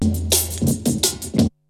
BREAKBEATS, TECHNO

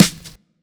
Dance Drums; Best Service ProSamples vol.15; AKAI

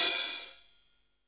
HAT, Hi-Hats, HH Modules; Roland L-CD701

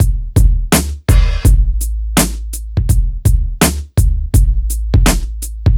DRM, Hip Hop and R&B Drumloops

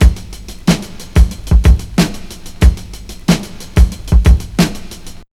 DRMSND, Pure Electro, BEATKIT

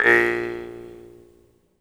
jaw harp, Pitched Percussn, Jaw Harps

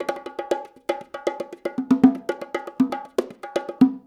Bongos, 70s Analog Funk; Sonic Foundry (Sony Creative Software); What it Is! 70s Analog Funk

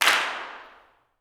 CLAPS, Gospel; CLAPS+SNAP; ILIO; Vocal Planet

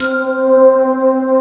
MERLIN C4, SD1 BELLS; East West - Global Sounds

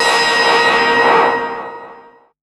loop; X-Static Goldmine 3

